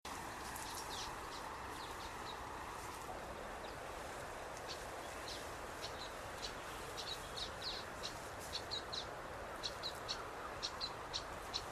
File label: Common waxbill